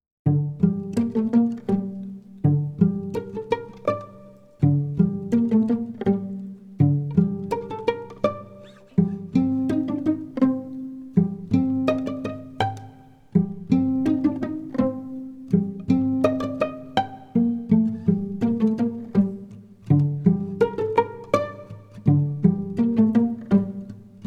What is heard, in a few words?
Meditation; Narrative Mind